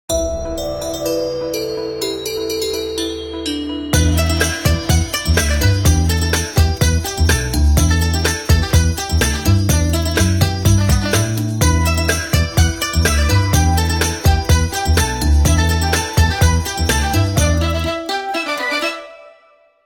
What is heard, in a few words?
ringtones; Sumatra Ring; Nokia; Asha 500